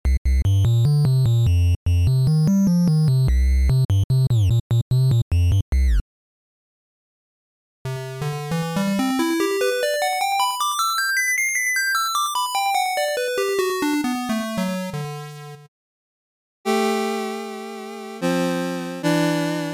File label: NES; 8bit